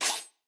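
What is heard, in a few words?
Goblin baby attack, 攻击音效; 哥布林会用匕首不断刺向对方; 部落冲突; 皇室战争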